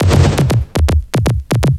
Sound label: RAVE; Rubber Chicken Software Sample Bank; RAVE CITY